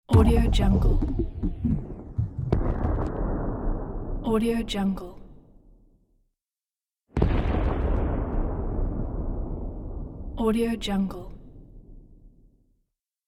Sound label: cinematic explosion